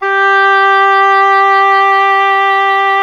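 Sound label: WIND OBOE; Miroslav Vitous - Solo Instruments VOL-2; Solo lnst. II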